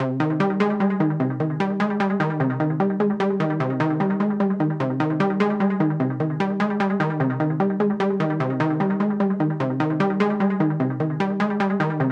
150 BPM C Min Juno-60 Arp, Synth; Loops; Loop Stems; Cymatics; Fantasy Synth Sample Pack

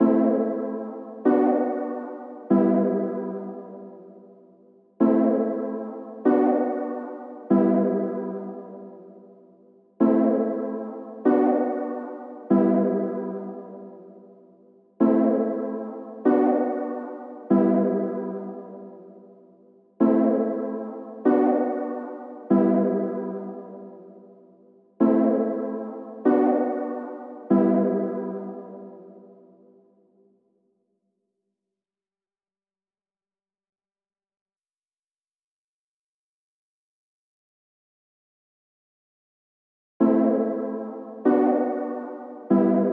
96 BPM E Min Chords; Cymatics; Infinity Melody Collection; Avarice

